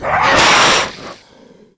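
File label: Lizard Man Knight Attack; Seer; GamigoNA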